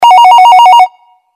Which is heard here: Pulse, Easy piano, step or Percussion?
Pulse